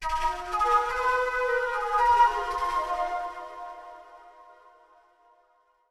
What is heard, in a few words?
Flute Factory Hall